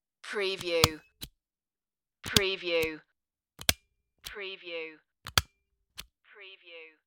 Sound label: office stapler